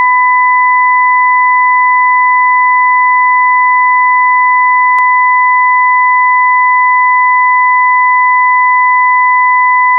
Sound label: 1000 p 2000Hz 0m 45deg